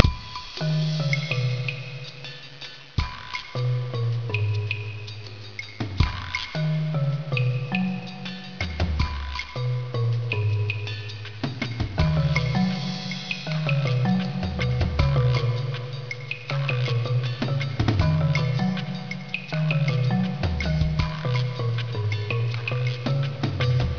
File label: Unused Music; Freddi 4